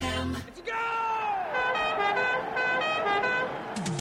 Goal Horn

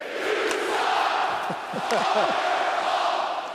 You s**k chant

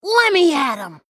Fizz

